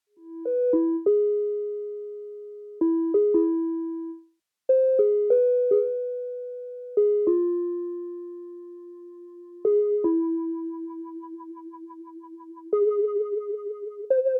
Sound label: Samples for workshop LFO Actions; shaping sounds